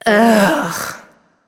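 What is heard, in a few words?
ugh, female; smite; voicepack; hera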